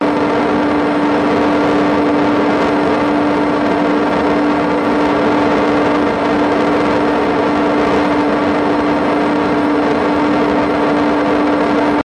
TRANSPORTATION JET MILITARY Fighter Turbine Steady